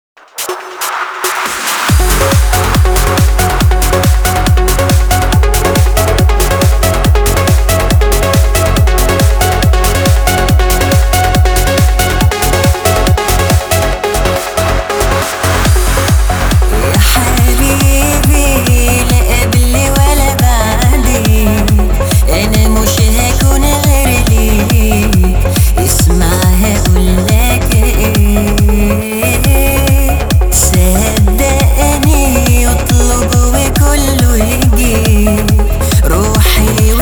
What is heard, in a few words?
Remix Bass